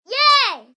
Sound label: Kids Yay, Male child, yelling